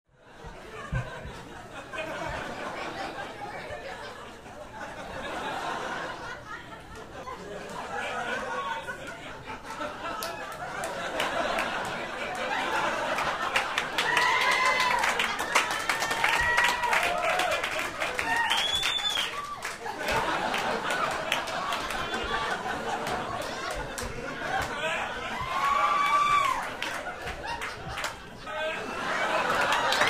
Laughter and applause in the room; standing ovation

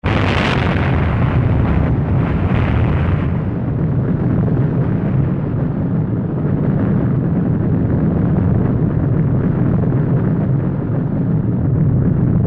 Atomic bomb explosion; explosions

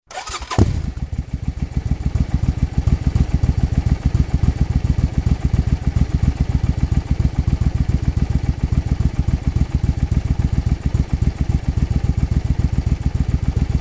Motorcycle starts, waits, turns off

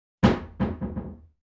wooden door closes

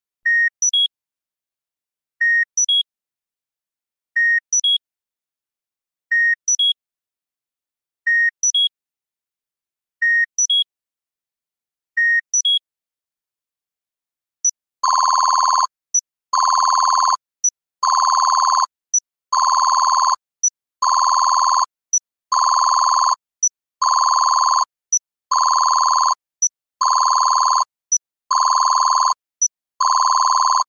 computer interface; radio signals

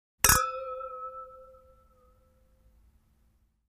Hitting the glass; tableware

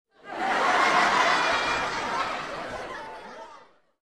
Laughing teenagers indoors

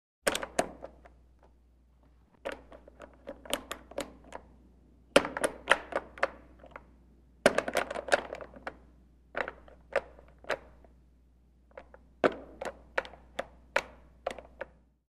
Pulling on the doorknob

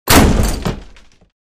Wooden door slams shut

